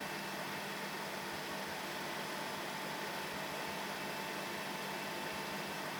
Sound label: ship engines; World Of Warships